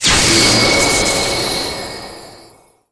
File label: levelup Limitation Lift